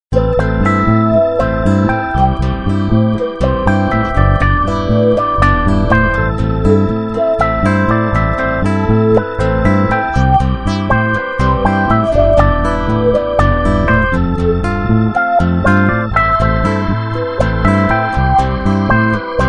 disco jingle

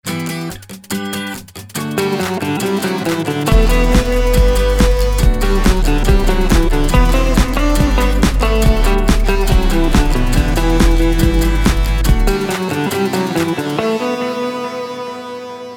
miami jingle

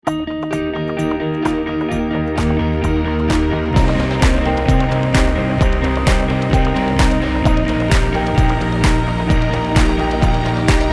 together jingle

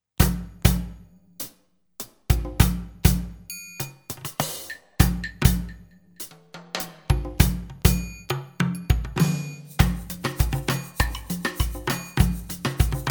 rythmic island

